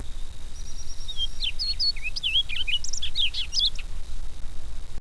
orchard oriole 1st year

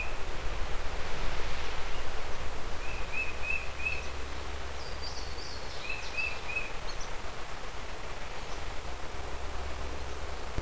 titmouse, Tufted Titmouse

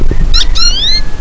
goldfinch

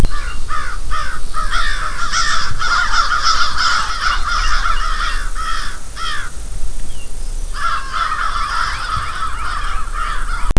crows, American Crow; BirdList

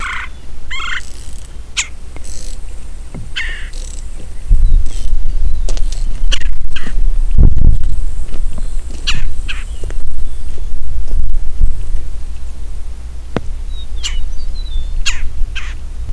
red belled woodpecker; Red-Bellied Woodpecker

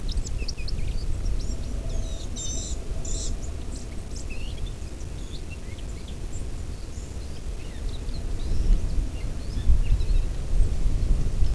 blue gray gnatcatcher song

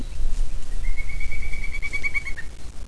pilated woodpecker; Pileated Woodpecker

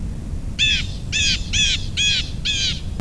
blue jay

